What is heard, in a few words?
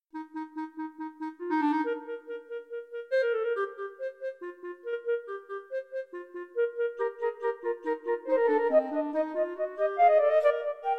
magic flute overture, flexible mixed ensemble 4 players